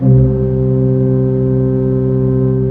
FLUTES C2, organ; AKAI S-Series CD-ROM Sound Library VOL-2

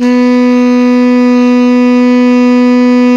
TENOR PP B 3, Saxophones; Greytsounds; Philip Wolfe David Crozier's Saxophones

